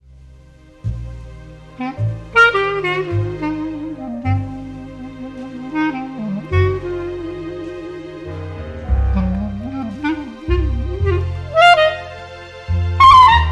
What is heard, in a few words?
Clarinet